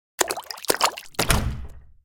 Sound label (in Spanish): puerta agua